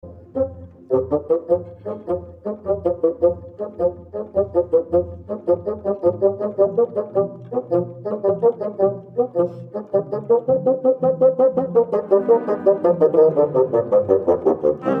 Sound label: bassoon